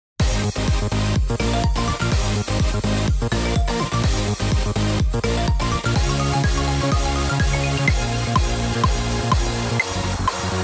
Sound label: Battle Techno; Digicalized; Android